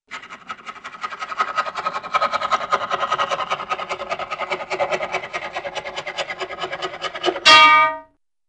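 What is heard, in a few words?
Funny Rapid Sawing That Ends with bell Bonk; Cartoon; Hollywood Edge; Cartoon Trax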